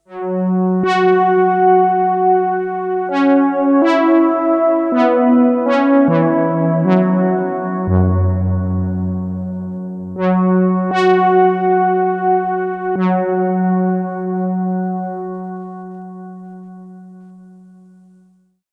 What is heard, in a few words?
Boss RV-200 Synthesizer Slowverb